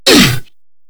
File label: Grineer Hammer Boss Pain B; Warframe